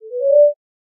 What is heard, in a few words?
ice; noteye; roguelike